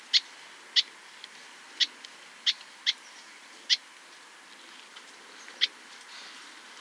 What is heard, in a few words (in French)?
fauvette des jardins